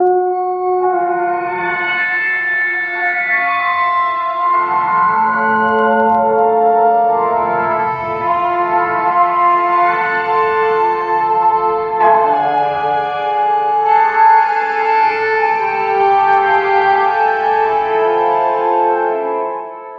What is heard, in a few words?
music